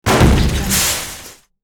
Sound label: medium impact with glass and metal debris; hollywoodedge